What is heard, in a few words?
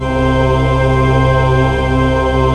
JV CHURCH, JV-1080; Syntec; Wall of Sounds